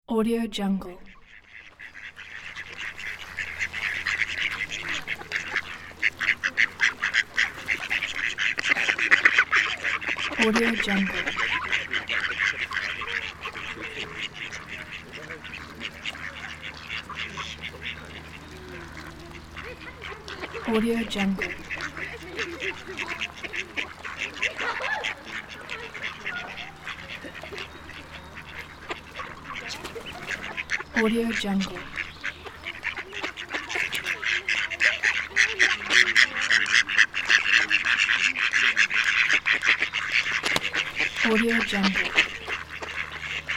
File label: ducks on pond